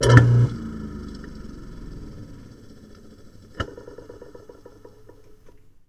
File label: DISPOSAL, Kitchen Counter; E-MU Producer Series Vol. 2; More Studio Essentials